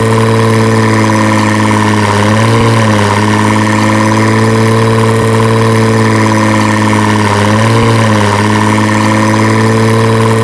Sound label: v12 special, engines; acf engines